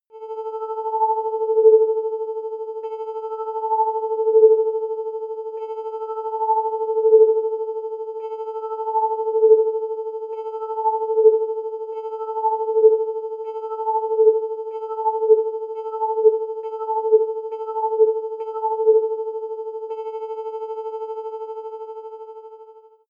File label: sci-fi scanner; energie